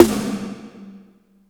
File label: eeker, snares real